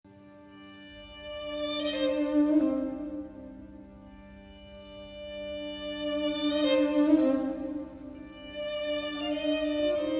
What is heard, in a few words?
String quartet